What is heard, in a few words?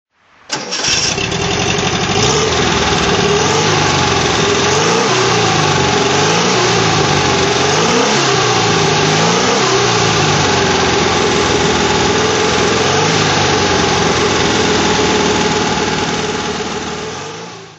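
porsche 356 cabrio throttle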